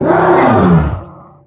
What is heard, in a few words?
injured